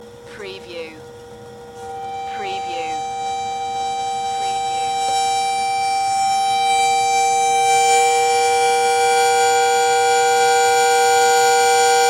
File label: Kettle Whistle